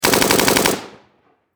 Warfare Machine Gun 7.62 Caliber Bren Lmg Long Burst Distant Perspective